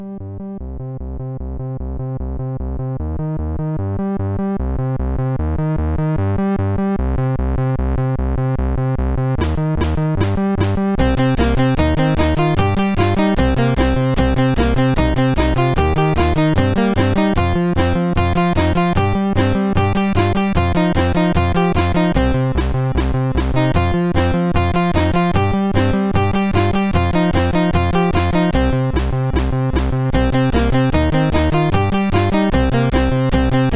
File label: sid, MOS6581R2; Sweet